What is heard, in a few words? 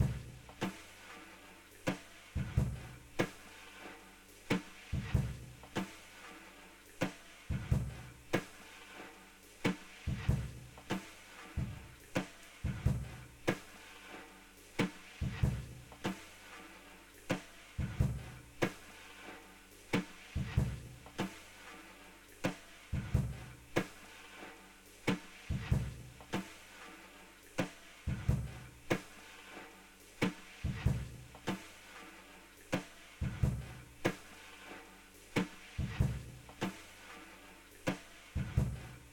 drums stem